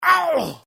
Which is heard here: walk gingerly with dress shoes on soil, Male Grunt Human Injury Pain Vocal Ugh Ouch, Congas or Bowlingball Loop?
Male Grunt Human Injury Pain Vocal Ugh Ouch